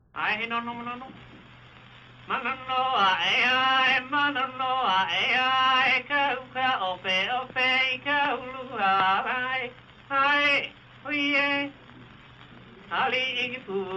Lamentation, Hawaiian; World Music; Na Leo Hawai'i Kahiko - Voices of Old Hawai'i

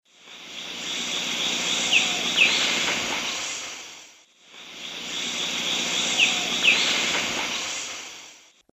Pachyramphus marginatus, call; S. America; Eastern Lowlands

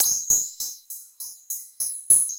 Beat; Electronica; Electronic Beats

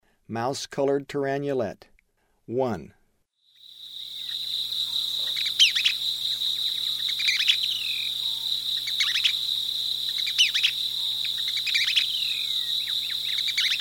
Mouse-colored Tyrannulet; S. America; Eastern Lowlands

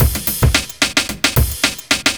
block rock kit

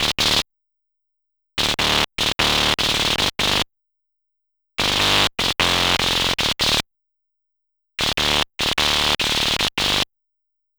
Synth, Rock

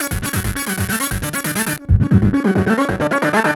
TRANCE

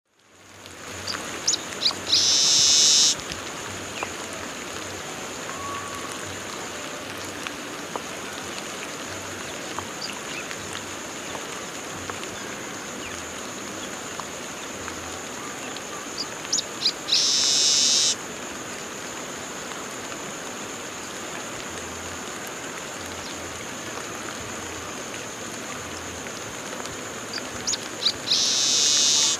Sturnella militaris, song; S. America; Eastern Lowlands

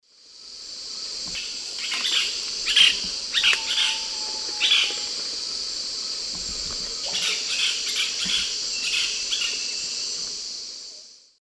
Celeus grammicus, group calls; S. America; Eastern Lowlands

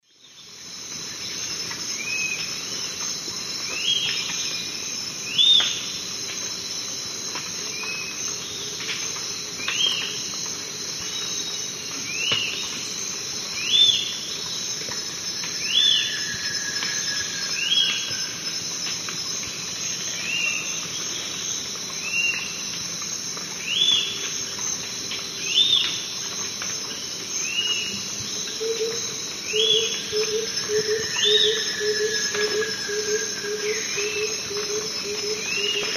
Tolmomyias assimilis, song; S. America; Eastern Lowlands

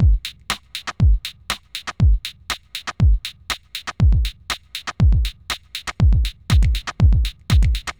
crunchy 125

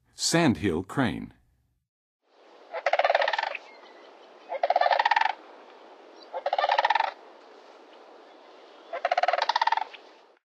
Sandhill Crane, Songs of Birds of North America; Western USA; Western USA Songbirds

